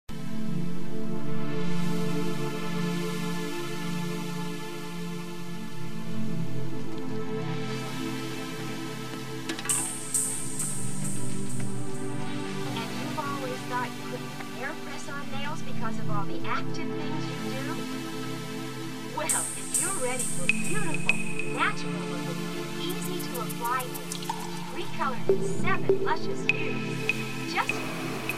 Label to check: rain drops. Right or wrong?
Right